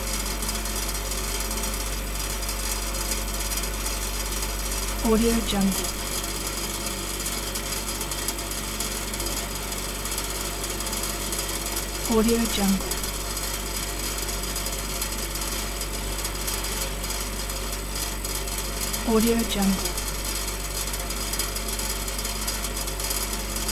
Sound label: household fridge old compressor run loop